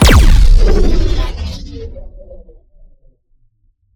Shot Electron Cannon

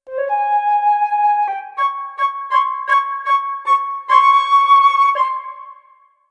flute

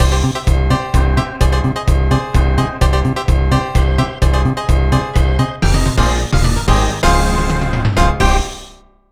Match Complete; Centre Court Tennis; N64

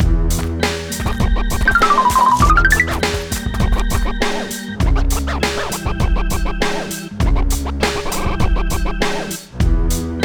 Scores; Dry Bones Scores; mario strikers charged gamerip

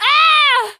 Female Pain

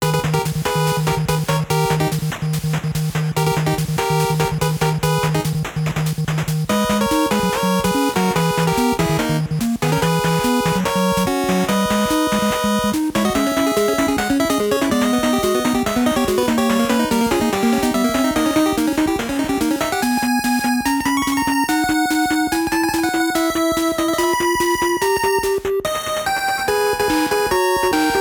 Stage; Dr. Cossack Stage 1

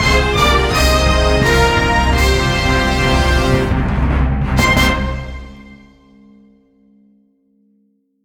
boss title card; nickelodeon all star brawl 2; ps4; xbox series xs

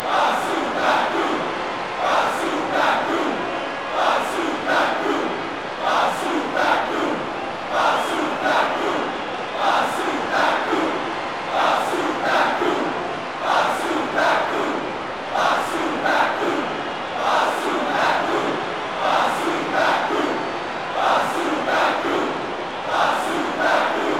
Crowd Song; Petey Piranha's Crowd Song (JP); mario strikers charged gamerip

